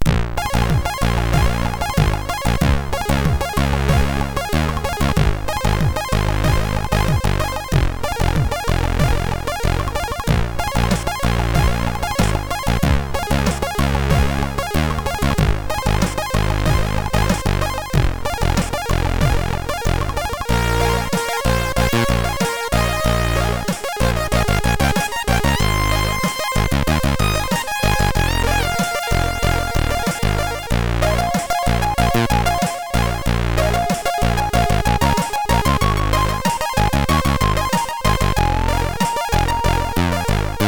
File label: Bahamas, sid MOS6581R3